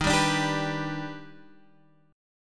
G Major Eb; G/Eb strummed

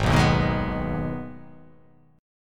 G sharp Minor G sharp down, chord

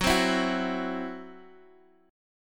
C-Major Flat 5th Gb, guitar

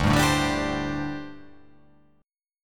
D 7th Suspended 4th, down

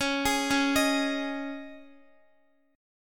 C sharp Suspended 2nd C sharp Guitar, chord; C#sus2; arpeggiated; x,x,11,13,13,10; 4E,4A,5D,5G,5C,6F

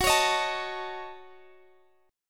F sharp Minor 6th, Guitar Standard; strummed; capo 2; x,x,16,18,16,17

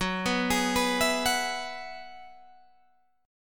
Gb 9th Suspended 4th, Guitar Open G

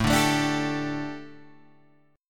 G Suspended 2nd A, Guitar

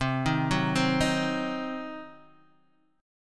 C Diminished C, Guitar Open D

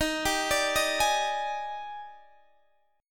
D sharp Major 11th, down, Guitar, Half Step Down

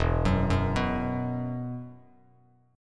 E Suspended 2nd E, down, Bass Standard; Esus2 arpeggiated

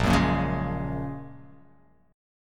A sharp Minor 7th Sharp 5th A sharp

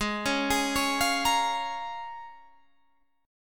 C sharp 6th, G sharp, guitar chord

